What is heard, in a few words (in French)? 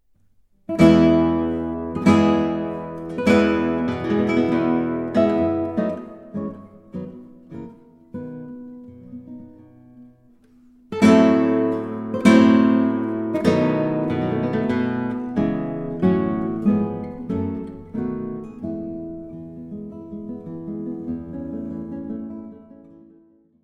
Fantaisie pour 2 guitares Andante Allegro; opus 54 bis